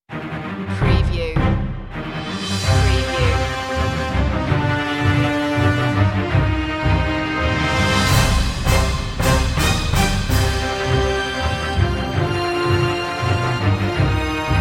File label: sol invictus no choir